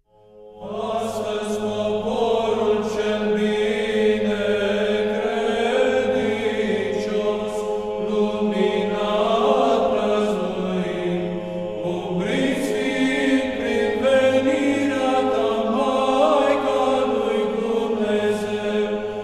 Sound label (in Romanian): Troparul Acoperamantului Maicii Domnului glas 4